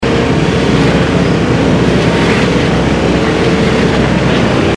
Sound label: nod harvester idle